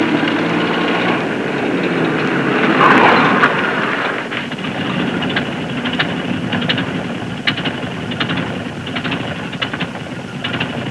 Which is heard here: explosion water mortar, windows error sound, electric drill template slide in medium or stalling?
stalling